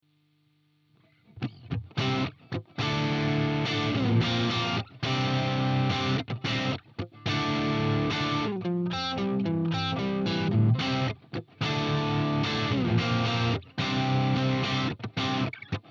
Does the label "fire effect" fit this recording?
No